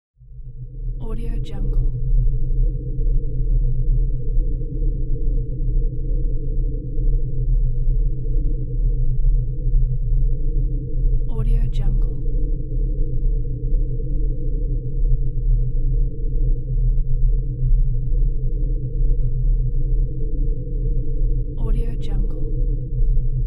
space ambience

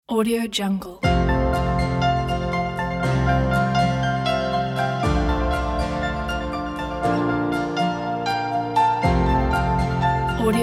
emotional cinematic; onewavestudio

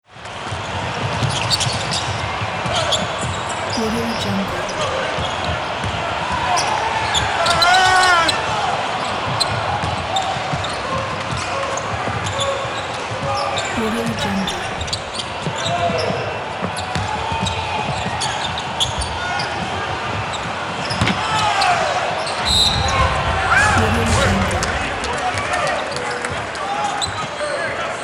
basketball game ambience

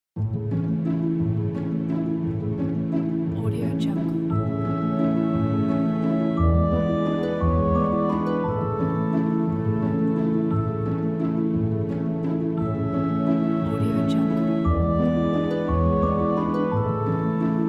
lullaby

